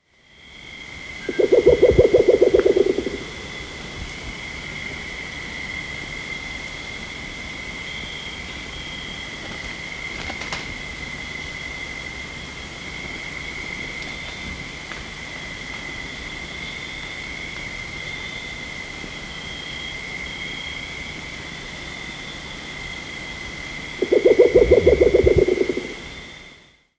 Band-Bellied Owl (Pulsatrix Melanota), Andean Birds; S. America